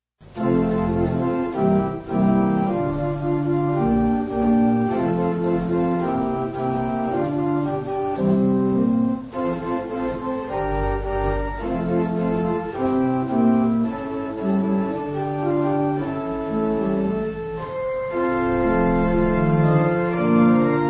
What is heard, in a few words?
music; desert